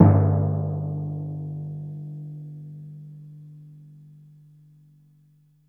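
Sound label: timpani mezzo forte G1; Best Service; Peter Siedlaczek's Advanced Orchestra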